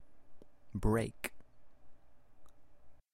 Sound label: Brake; transportacion